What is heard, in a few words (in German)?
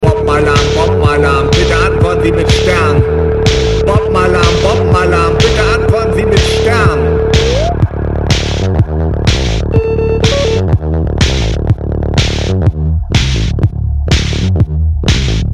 Bombenalarm